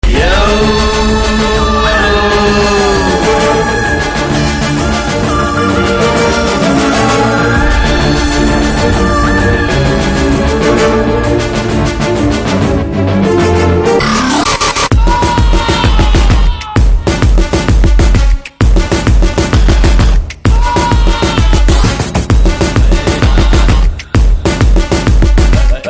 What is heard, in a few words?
BG Tone Telugu Ringtone